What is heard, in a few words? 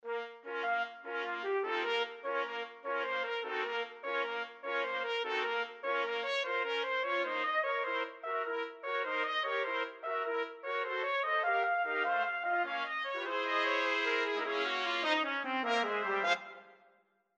trumpet quartet